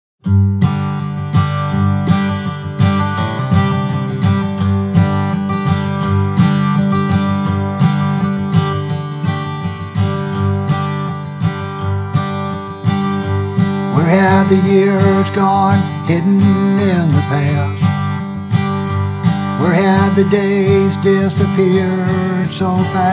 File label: Blues